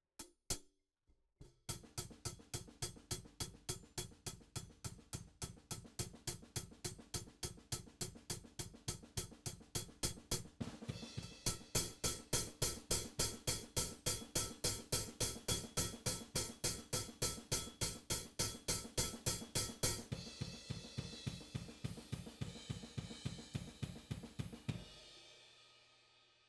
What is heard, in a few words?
Hat